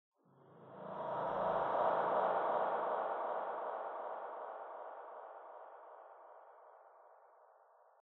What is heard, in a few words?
Combat Choir; The Sinking City